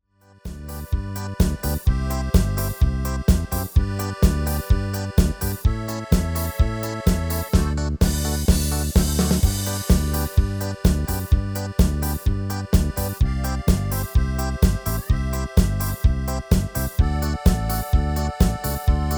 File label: MIDI